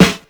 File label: strong bottom end snare sound f key; snare drum samples